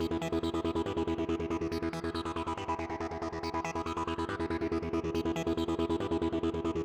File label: Syncer Arp WET 140BPM, Eminor; Melody & Synth; Vengeance Samples